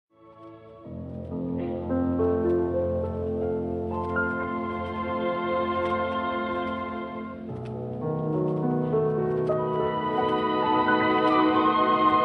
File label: Piano Concerto in A major Adagio sostenuto assai; Liszt